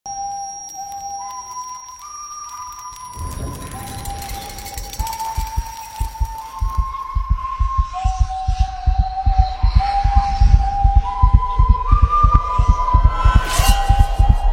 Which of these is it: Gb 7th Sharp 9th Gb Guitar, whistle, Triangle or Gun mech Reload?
whistle